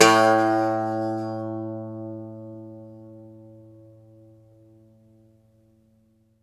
IRISH BAZ, Plucked Strings, Irish Bazouki

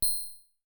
DANCE SET, DRUMS

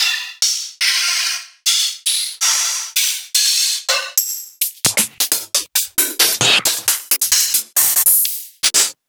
Open Hihats

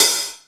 half open, Drums Percussion; Drum Bass; Zero-G; Total Drum Bass

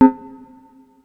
Syn Cowbell, Ensoniq ASR-X Pro

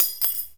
TEK NAIL, industrial

